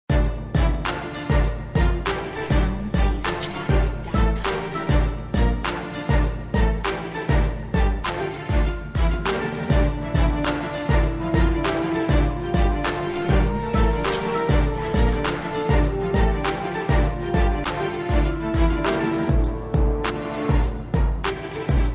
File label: Orchestral action